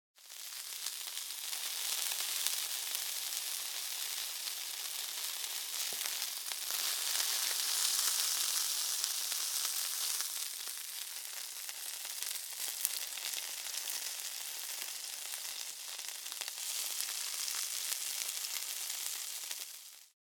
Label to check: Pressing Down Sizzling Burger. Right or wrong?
Right